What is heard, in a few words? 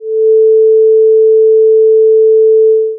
sine